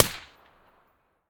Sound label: usp45 silencer mono distant